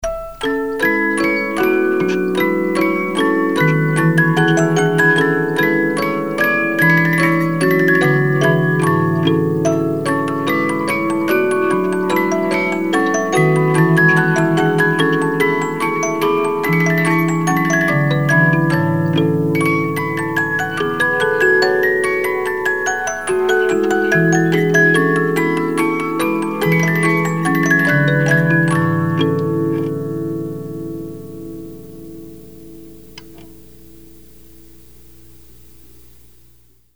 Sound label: Eine Kleine Nachtmusic Minuet, 72 Note Movements